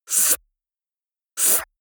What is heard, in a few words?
beatbox reverse suck in air